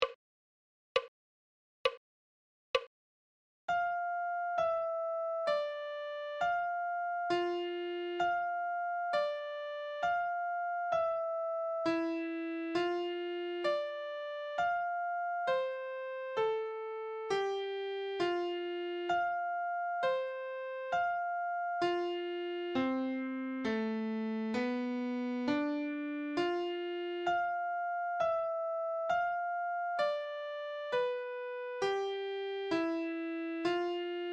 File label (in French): clé de sol adagio